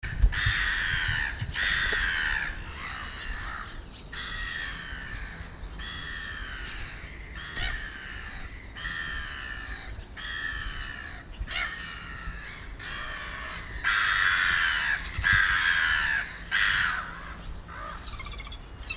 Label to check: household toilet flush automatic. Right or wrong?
Wrong